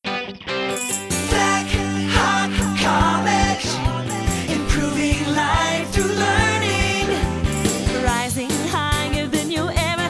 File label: Full Sing, school education jingles; Black Hawk College